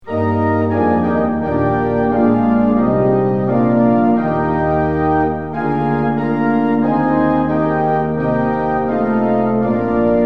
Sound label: tune; hymns; lillenas